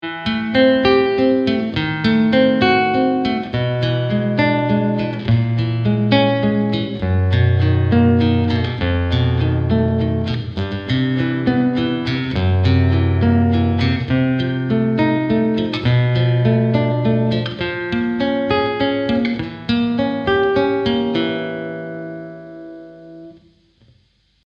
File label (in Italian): Esercizio, Chitarra sola; LA TECNICA DELLE MANI un trittico di esercizi; 1 Esercizio; Silvio Barisone